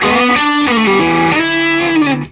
SOLO E, Vintage Blues Guitar; AKAI; USB Soundscan vol.22

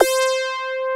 SAW BASS; MORPHEUS

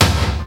BIG TOM; Greytsounds VOL-1; SDX TOMS

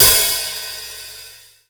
POWR HH, POWER DRUM; AKAI S6000 CD-ROM - Volume 1; DRUM RYTHM

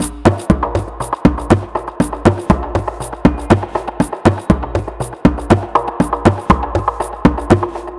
Conga Loop, Ethnic Instruments

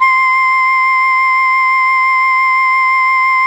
Melodium C5, Fairlight CMI; Keyboards

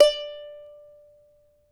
CHARANGO; Spectrasonics; Hans Zimmer Guitars VOL-2

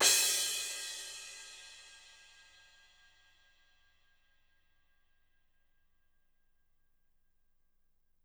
crash, crashes

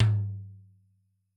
Toms, Yamaha RY20

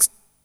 KIT HOU; Best Service; Dance Mega Drums

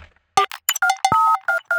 glitch fill

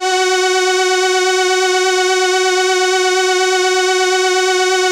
SYN, ENSEMBLE

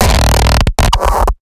Artifacts, bass

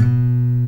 ACSSFTFNGR, Guitar

